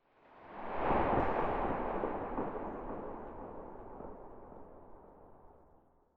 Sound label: gauss shoot far, weapons